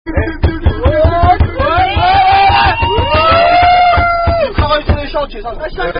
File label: Rugby Down-Down Chant; Rugby Bufalo Crevitz; Strange Down-Down Chant